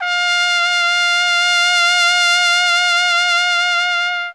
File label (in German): TRP WEICH F2, TROMPETEN, Alpine Volksmusik; Best Service